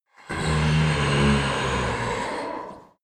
demon heavy breathing groan; kreaturen

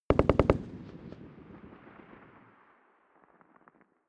rifle ak-47 exterior fire burst distant; schusswaffen; Firearms

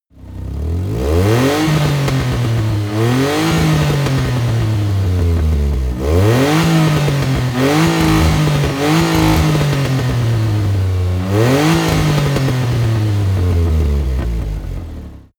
car buggy rev up extreme

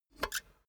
cash box metal lid open hard; maschinen; soundpool

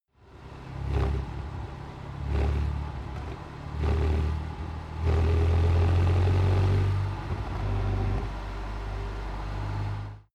sports car int engine various sequences; verkehr transport; autos

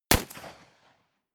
rifle ak-47 exterior fire single clean; comedy-cartoon; Pistols and guns; pistolen-gewehre

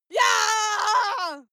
female lunatic scream attack

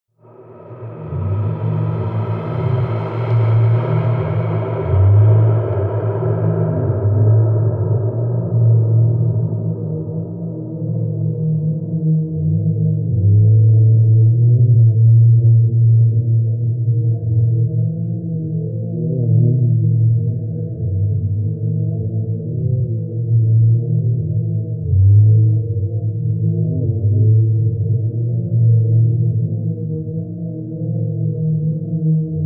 drone splice

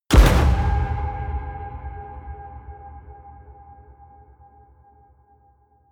door slam horror small

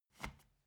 step ladder wood scrape single

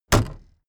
car camaro close trunklid

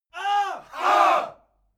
military shout ah

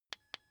car key button click signal

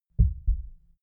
heart beat double quiet

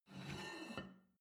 plate take table slide, gastronomie; berufe; soundpool

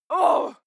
female fight shout exert; schreie; menschen

